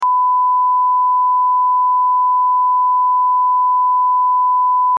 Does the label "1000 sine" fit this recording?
Yes